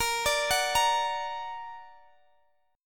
A sharp Augmented, Guitar Standard; arpeggiated; x,x,20,19,19,18; down; capo=9

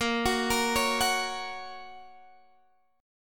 A sharp Minor Sharp 5th A sharp down

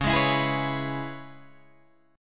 C sharp Minor 7th, Guitar Open D

